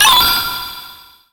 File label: noticing